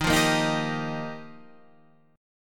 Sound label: F 7th Suspended 2nd Suspended 4th